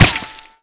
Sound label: impact dirt; Modern Warfare